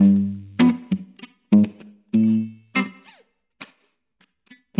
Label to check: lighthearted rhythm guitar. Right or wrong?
Right